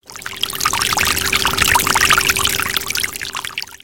3d Water Pour Sms

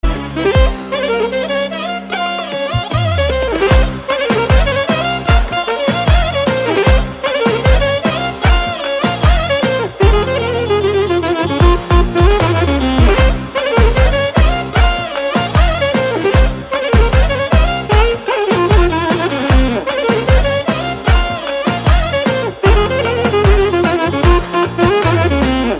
Dance Oriental

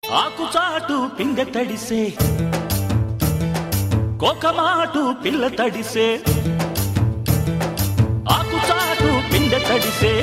Old Song

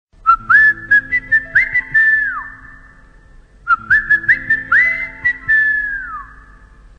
Hot Whistle

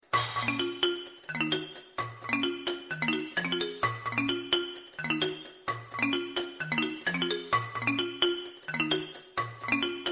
Click Song

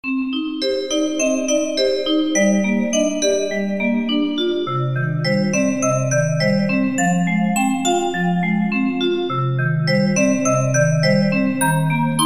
Lullaby

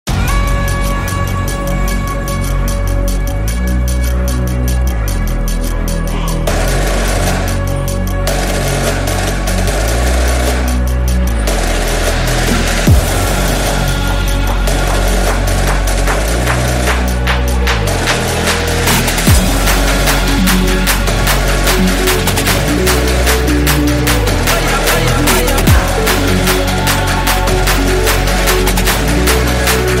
Tribal drumroll TRAP

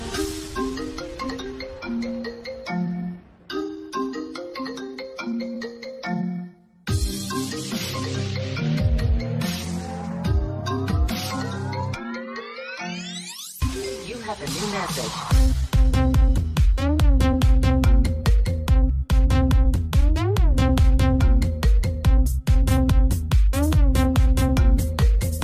Iphone Remix

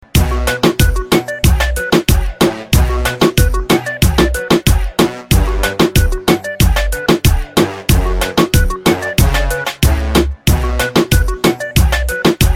Reggaeton Remix

